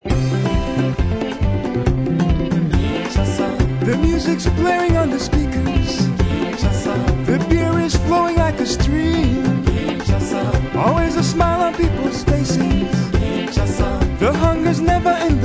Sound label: african rhythm machine; International; Music from the Congo